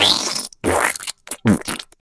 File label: fart wet